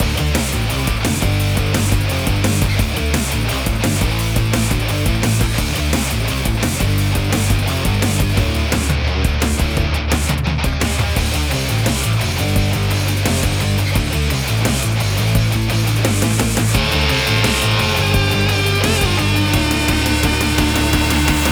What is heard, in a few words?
Level Volcano Loop; running gods